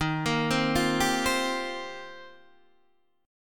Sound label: D sharp 6th Add 9th, Guitar, Open D